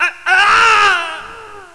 scream

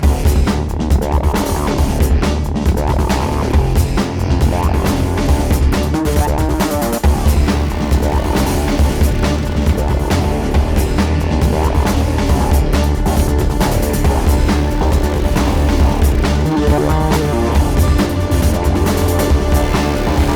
scanning loop

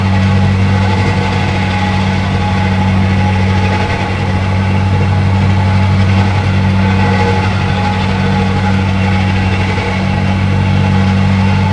grinder, ambience; caged

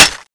clip out; weapons

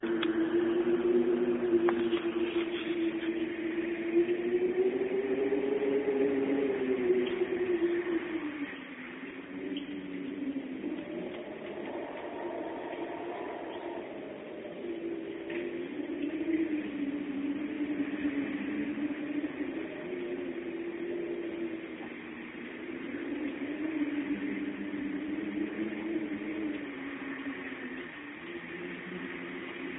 venta

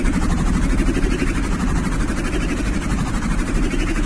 alarm, ambience

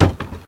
door wood place

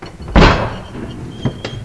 train

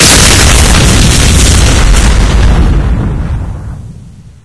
timer expl

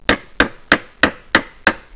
hammer board, ambience

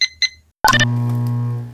checkpoint interact

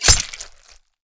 hit, knife

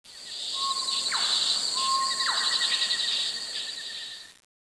birds; ambience

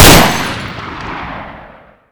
saw fire; weapons; DarkCity

